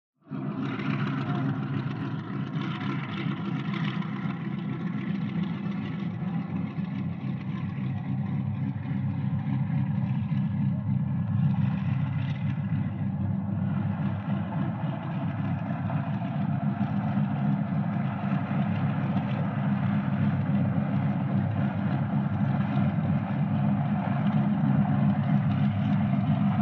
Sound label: AIRCRAFT PROP ANTIQUE TWIN ENGINE: EXT: Idle, take off, away.; ww2 fighters